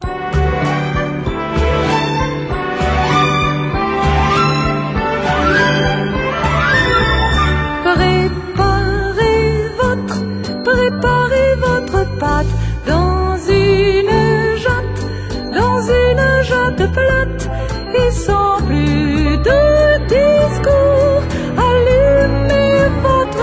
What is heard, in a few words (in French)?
sonnerie; peau d ane